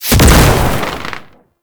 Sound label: Quick Strike Projectile Explosion; Enemies; Abilities; Warframe; Tau; Roathe